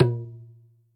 Tabla, Percussion, Yamaha DTXpress

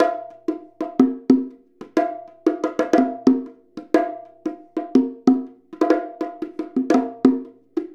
Bongo; Essential Percussion

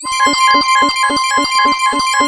C5, SynthLine; 90 s Sample CDs; NiceBeats; SynthLine VOL-17

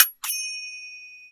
sfx perc

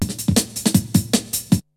BREAKBEATS, TECHNO

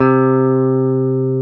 GTR, Dual Guitar; Club-50 - Foundations; Paul

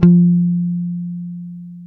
F 4, go-go mute, bass; Spectrasonics; Bass Legends CD 1

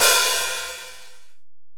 RAP OHH, LOFI HATS

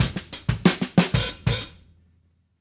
Call And Response Drums Ending, 70s Analog Funk; Sonic Foundry (Sony Creative Software); What it Is!

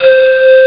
pan flute; ST-XX Amiga Soundtracker Sample Packs

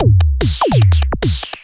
Beat, Drums & Percussion; Electrocution; Beats

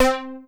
arpeggio; Masterbits; Vintage Voltage; SEQ-FX

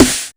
TEK 909 SN; Drums In Your FACE!

